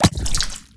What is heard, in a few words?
tentacle